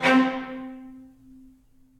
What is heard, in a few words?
Cello Section Staccato; Ableton; Orchestral Strings